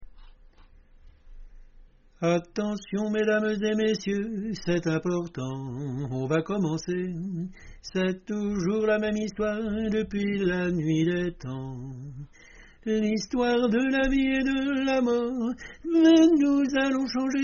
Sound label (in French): soprano